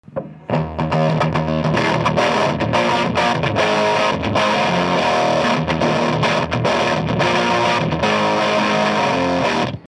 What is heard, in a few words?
Ampeg GVT Combo Distortion Mid Boost